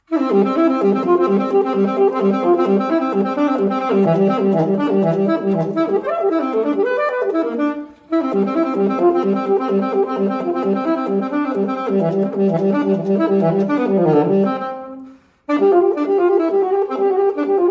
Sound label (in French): Hymne europ en saxophone; erasmus-2024-2025